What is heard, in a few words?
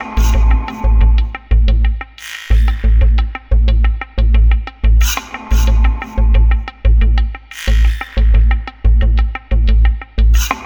Groove right hand 90